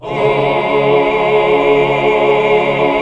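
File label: chord 2 5 L; Best Service Gigapack 1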